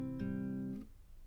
Tape GTR

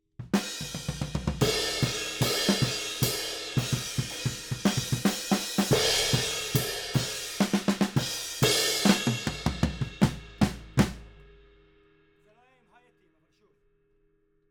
Ride

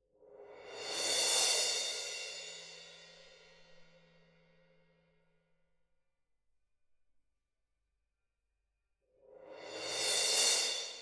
Perc

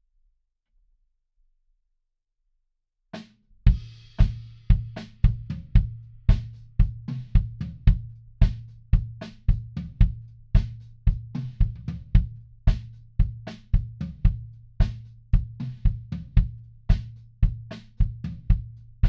Kick In

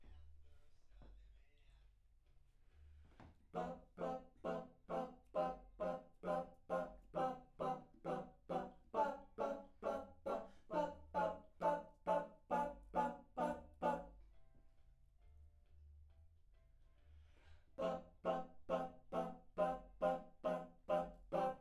Backs AKG LEFT

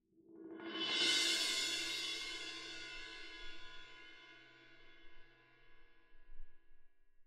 Cymb Main

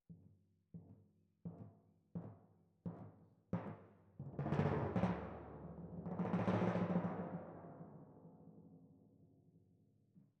Timp Close

